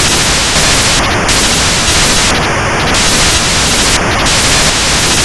Storm; Cheap Golf; Susan Supporter Pack

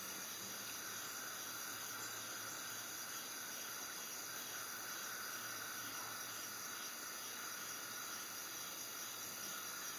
Amb Jungle Baseloop

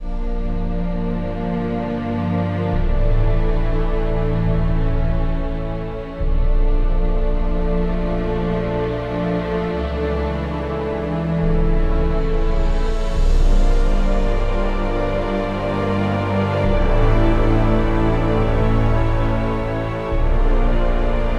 Tower Shutdown; The Witcher 3 Wild Hunt

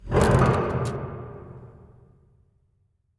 Gate Close; Backrooms 2D

